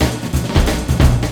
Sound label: LOOP SD, 180 BPM; Ueberschall; Drum & Bass Flash Trackz